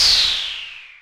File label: analogue noise; drums pads; noises